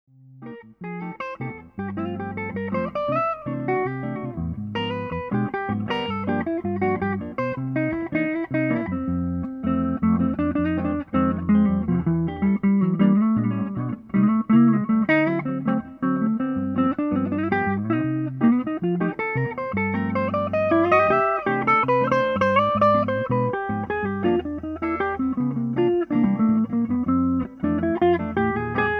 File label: ReverbSwap